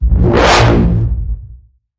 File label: Bend The Bassline A sharp